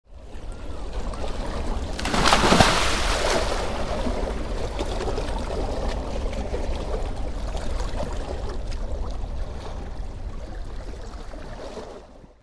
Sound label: Large ice block falling in water